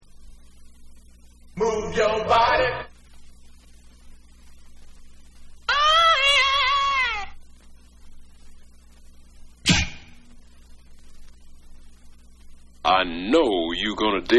SCRATCHES

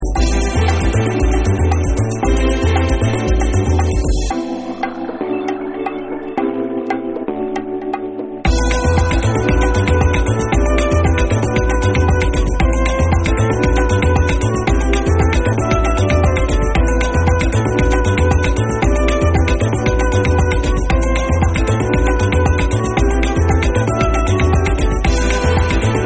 BOSSA, Lounge Mix

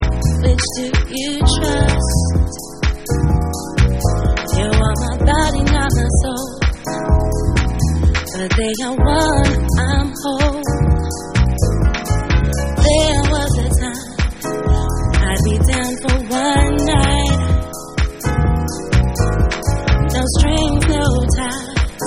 Vocal

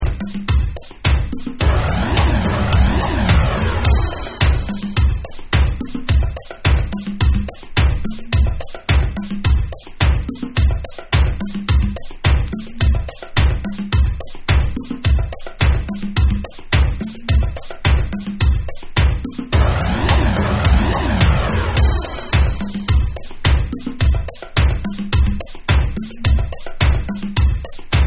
Groove Mix Bonus Beats

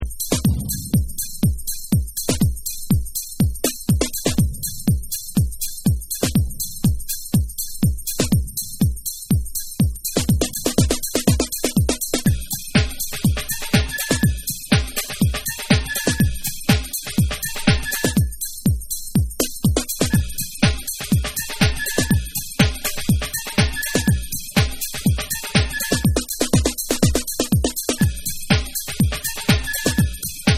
RAGGA HOUSE Bass Beats

